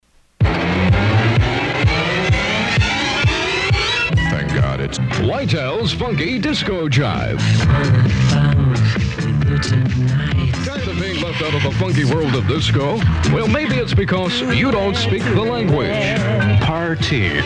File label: FUNKY DISCO JIVE